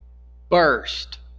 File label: burst